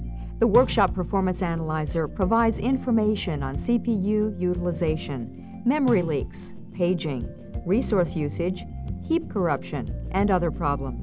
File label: workshop performance